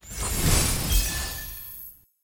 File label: level up; nba